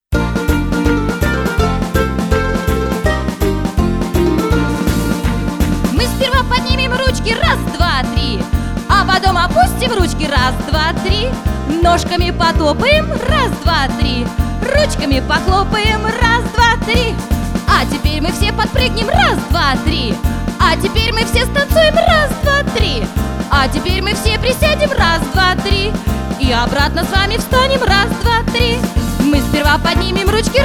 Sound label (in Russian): физминутка музыкальная игра; muzykalnaya zaryadka